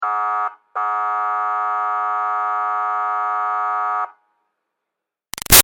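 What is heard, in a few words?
Siren Police Buzzer Long Medium Far; Emergency Vehicle Sound Effects; Big Room Sound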